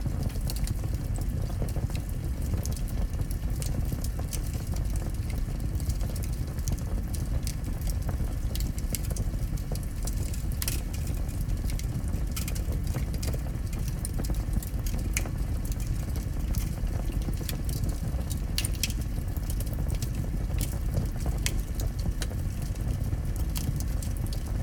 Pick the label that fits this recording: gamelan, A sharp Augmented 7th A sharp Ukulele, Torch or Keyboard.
Torch